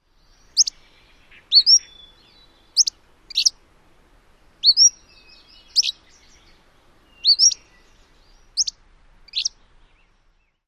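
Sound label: calls, Cordilleran Flycatcher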